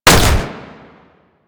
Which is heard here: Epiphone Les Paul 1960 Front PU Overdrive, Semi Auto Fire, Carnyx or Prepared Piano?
Semi Auto Fire